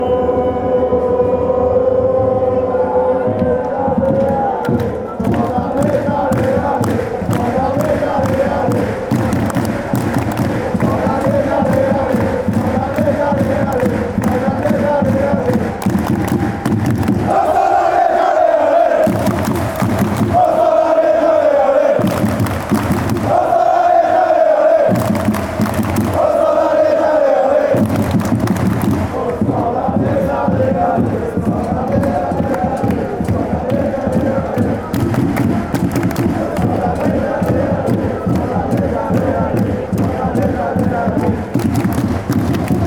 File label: oh standard liege ole ole, fanchants